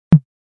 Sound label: Tom, e-drums